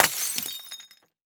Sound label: Crash Cinder Block Dropping On Glass Debris; Crashes